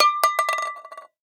can tin empty drop ring

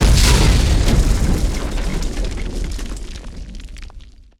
Explosions Building Blast

